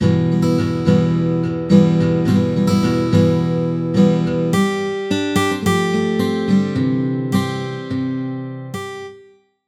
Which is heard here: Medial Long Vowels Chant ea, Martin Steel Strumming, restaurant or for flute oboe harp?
Martin Steel Strumming